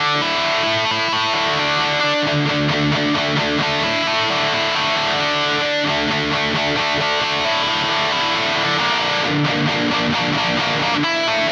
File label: Harley Benton DNAfx GiT Amp Peavey 5050